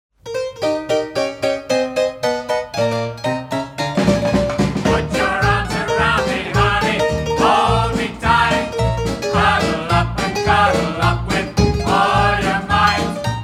Piano, Medley

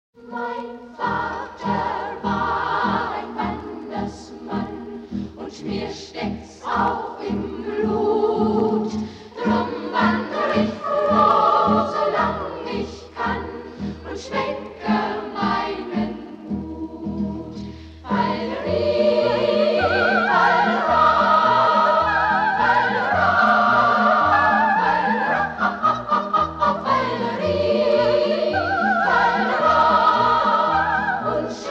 Children's Choir

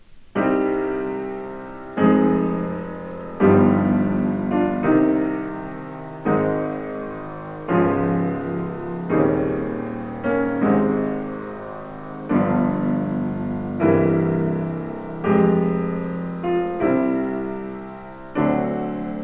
Prelude in C Minor

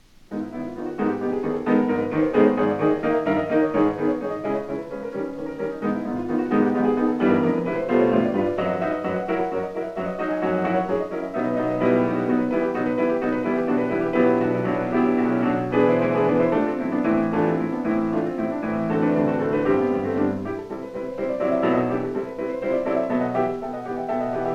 Prelude in G-Sharp Minor

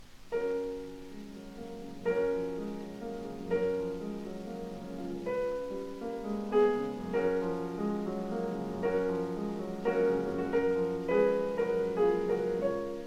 Prelude in F-Sharp Major

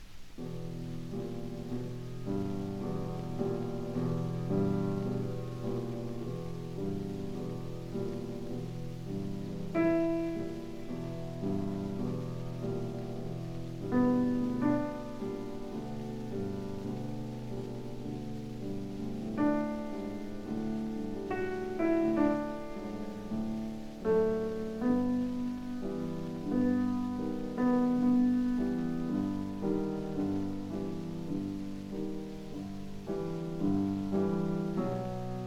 Prelude in A Minor

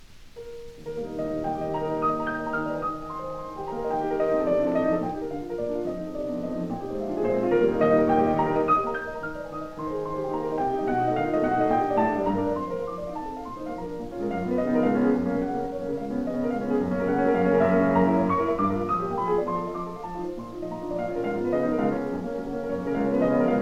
Prelude in E-Flat Major

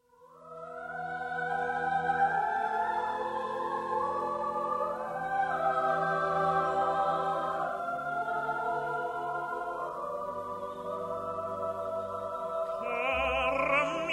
With Chorus & Orchestra

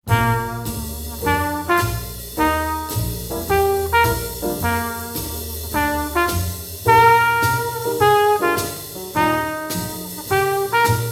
Quartet Version

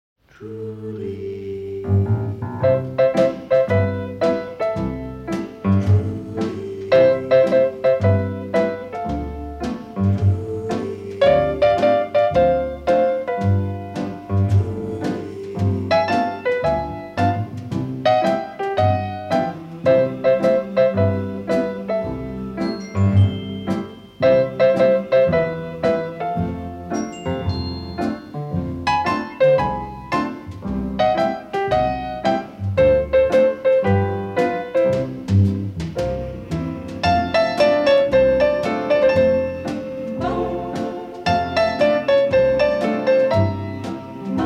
Piano

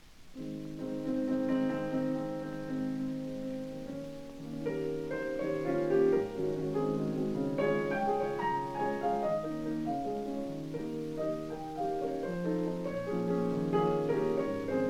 Prelude in A-Flat Major

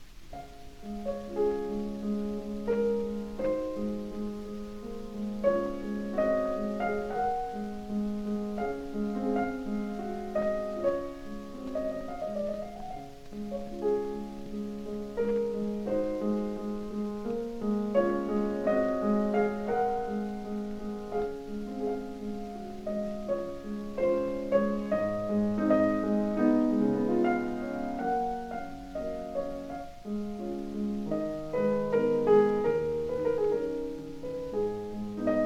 Prelude in D-Flat Major

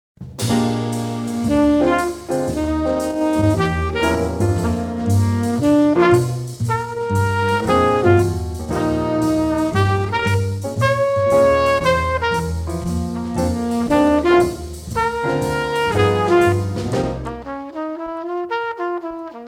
Quartet, Quintet Version

